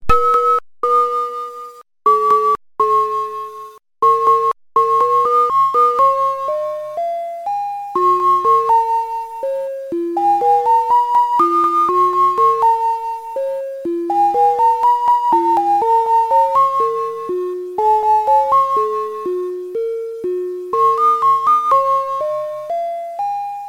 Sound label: onsen sine